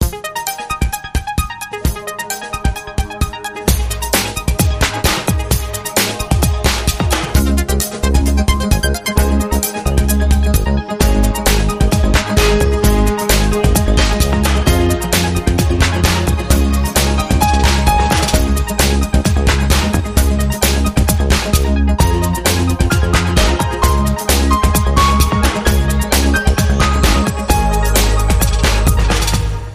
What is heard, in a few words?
Positive Vibe Talk Up